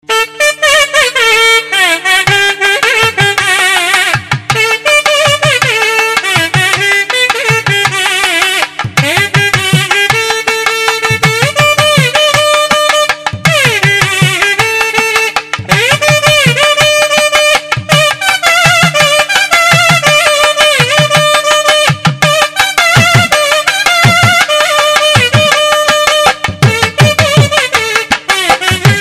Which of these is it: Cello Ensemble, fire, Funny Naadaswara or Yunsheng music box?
Funny Naadaswara